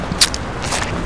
Mud Step; Outside

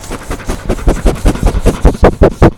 Dog Sniff; Animals

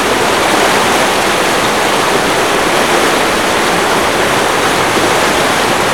Water Ful Loud

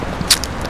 Leaf Step; Outside

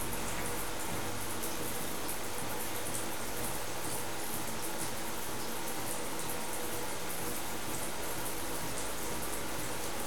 Rain Mild; Weather

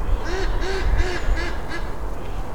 Funny Bird; Birds

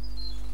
Bird Chirp; Birds

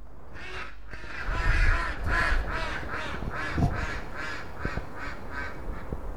Ducks, Birds

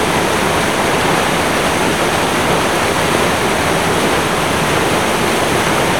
River Wild, Water

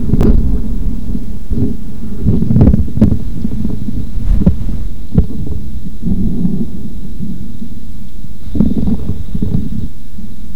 Distant Thunder; Weather

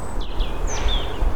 Bird Chirp; Birds

